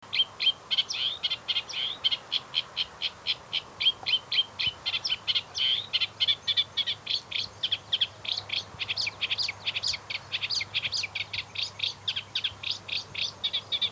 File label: Reed Warbler, East Chevington; passerines